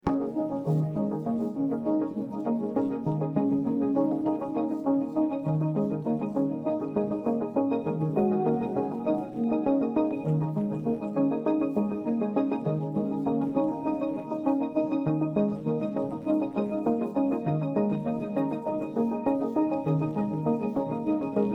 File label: no vocals